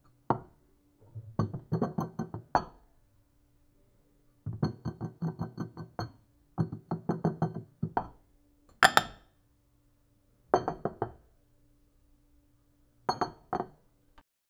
soft ambient sounds like

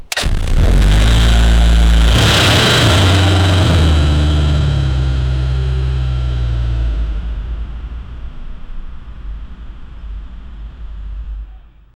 a car engine backfiring with a loud explosion

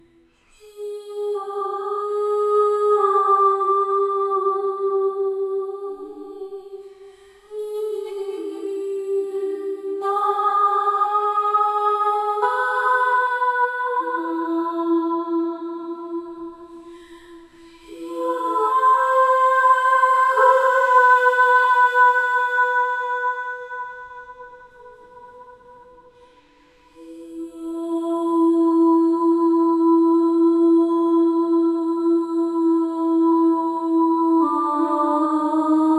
a woman sings with slow

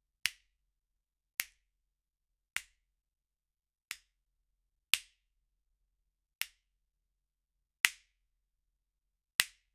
the sharp crack of a whip in the air

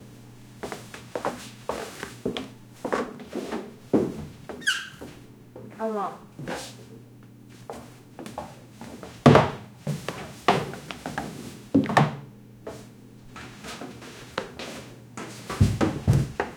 a woman enters a room

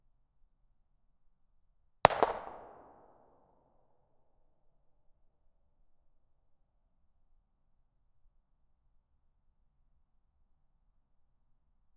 a distant 9mm gunshot

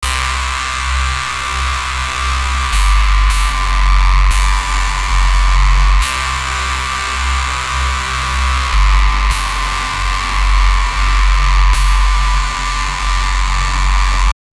a nasty sounding dnb rees

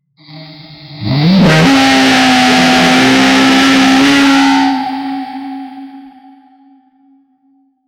a gutteral yeti roar that

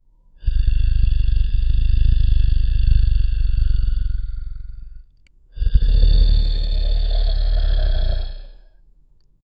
dragon roaring and breathing fire

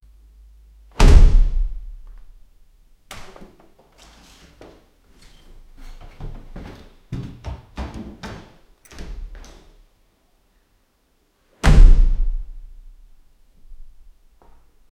front door being shut fol

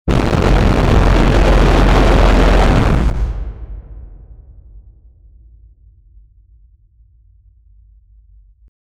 a loud air horn blast signaling a victory

